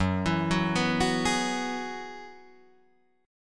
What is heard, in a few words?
F sharp 9th Suspended 4th F sharp Guitar Drop D